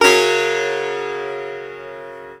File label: SITAR CHORD; SITAR CHORDS